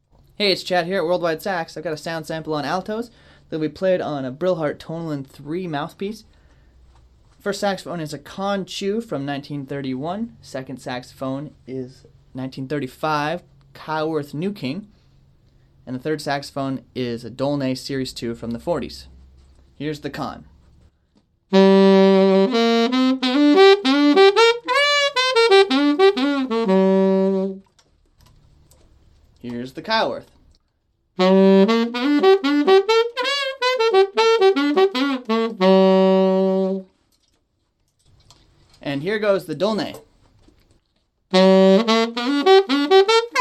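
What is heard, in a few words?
Altos Chu New King Dolnet, alto saxophone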